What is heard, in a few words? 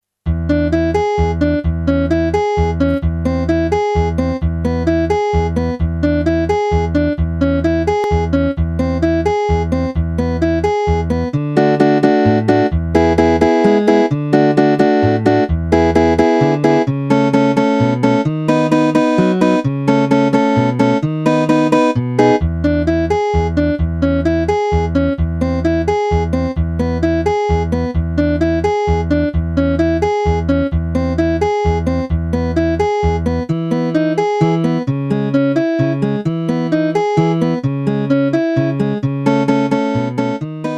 Samba